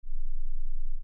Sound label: 5 sec silence